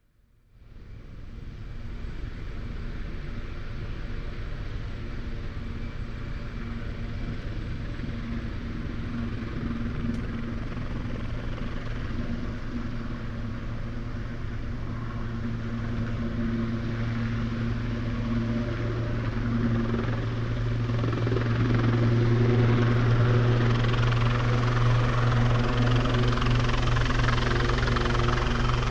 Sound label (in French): Helicoptere passage de droite a gauche vol bas